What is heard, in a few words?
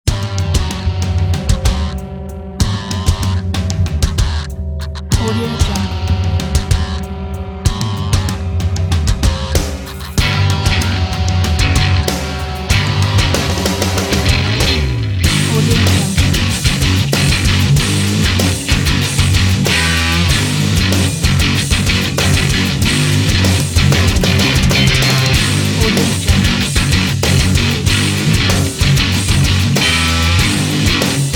hard rock